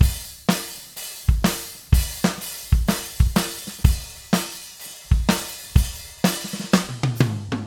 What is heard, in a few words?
Drums Dry